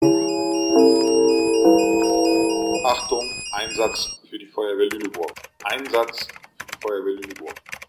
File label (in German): Alarmierung